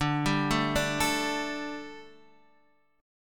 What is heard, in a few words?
D Suspended 4th, Guitar, Standard; 10,10,7,7,10,x; arpeggiated